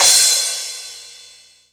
big crash single hit c key

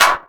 perfect snare single hit c sharp key; 1990s

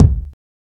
short reverb bass drum sample c sharp key

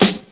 dirty snare drum sound F sharp key

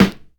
solid snare drum sample G key

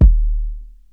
90s large room rap steel kick drum sound d sharp; D sharp key

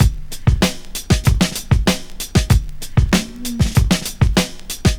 96 bpm 00s breakbeat c sharp key

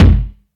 small reverb kickdrum sample g sharp key

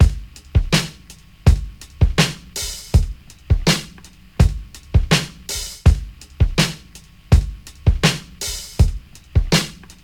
82 bpm drum groove g key

